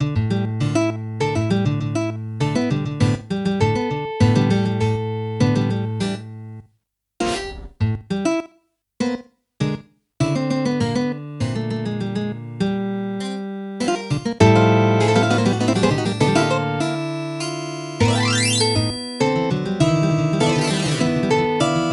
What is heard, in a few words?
Robot Lute